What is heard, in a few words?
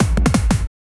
punch powerful beat